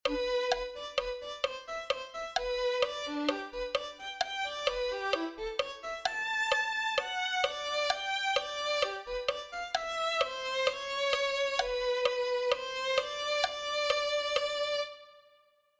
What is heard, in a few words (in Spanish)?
violin